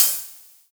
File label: Raptor Open Hat, Dubstep; Drums; One Shots; Cymbals; Hihats; Cymatics; Dubstep Starter Pack